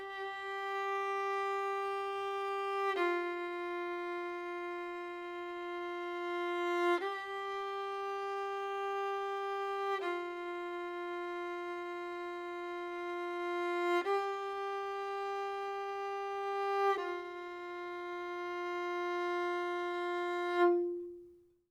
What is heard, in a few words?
violin